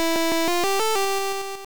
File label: Level Up; virtual lab virtual boy